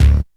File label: D&B Bass Hit; neuro